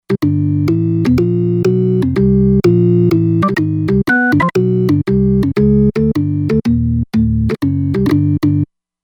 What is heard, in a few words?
Bass Organ Bass, synth